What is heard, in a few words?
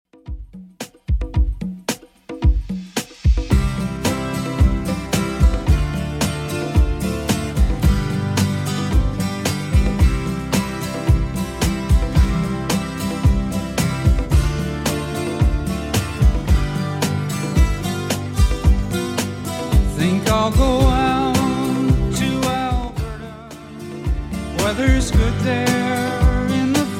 70s Redrum 111bpm